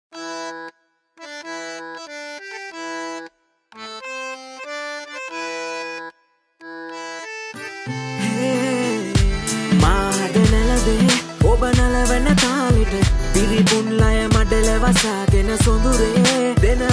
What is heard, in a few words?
Sinhala Rap Song